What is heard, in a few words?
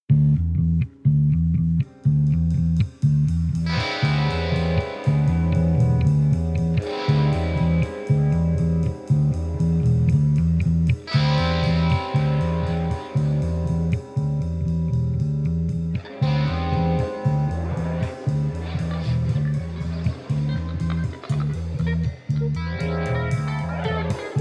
Sound Check Jam; Starved Rock LIVE (2016)